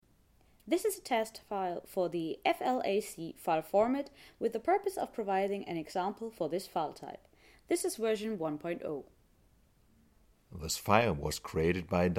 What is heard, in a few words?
Drums